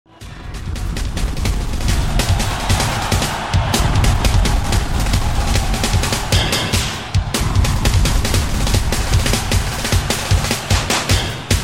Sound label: dancing snare intro